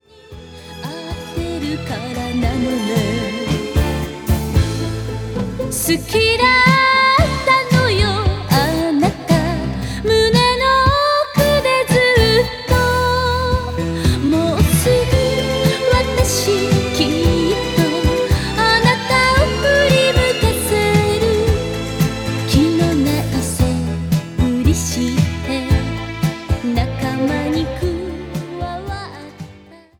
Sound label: idol